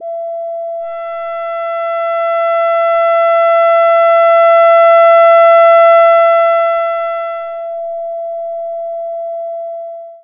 Erica Fusion Plasma Drive Sine Dry Wet Sweep Voltage Minimum